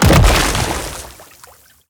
rock

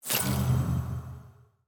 ui convert data

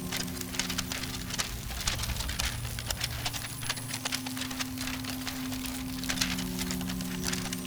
paper magic loop

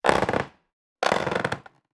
wooden ladder

